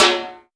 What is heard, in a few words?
Percussion; Vengeance Samples